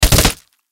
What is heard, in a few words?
Bullet Impact Human, Impact Bullet Hits Human Fire Multiple Body; Machine Gun Fire; Warfare; Blastwave